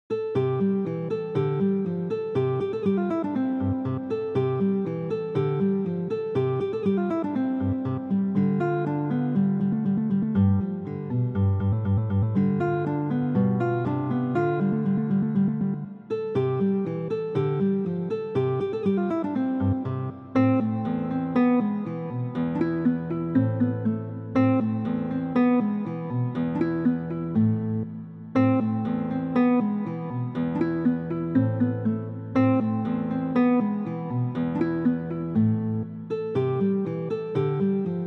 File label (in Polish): zegar z kukulka, guitar